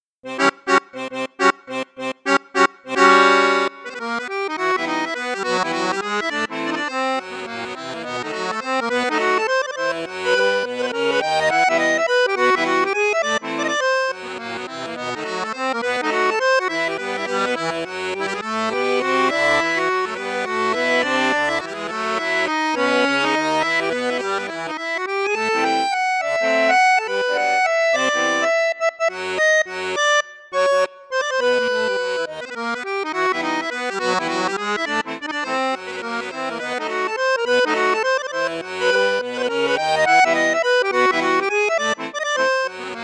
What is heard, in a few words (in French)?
registre gros basson flute juste, accordeon; cavagnolo vedette; cavagnolo vedette 5